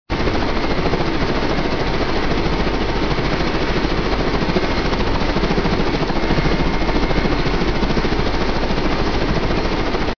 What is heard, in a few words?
helico; SOUNDDOGS; 3dcity